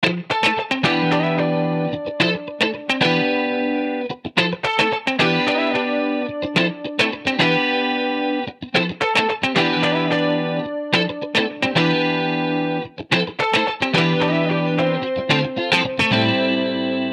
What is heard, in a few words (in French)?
sonnerie